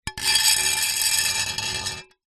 impacts glass small pane sheet thin scrape on ground